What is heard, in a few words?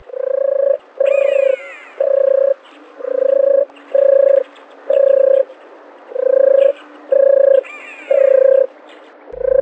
t dove